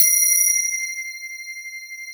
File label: BELLS C5; JDBELLS; Synth